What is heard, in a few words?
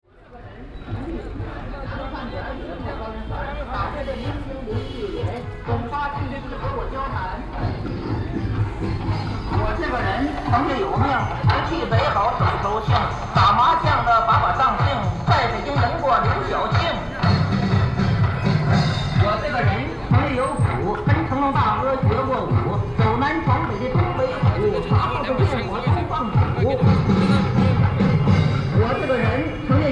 comedy DVD and bamboo clappers